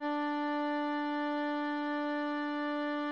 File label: instrument note